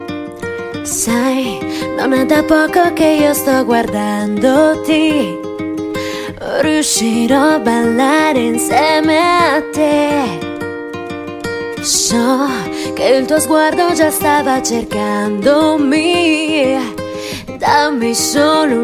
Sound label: female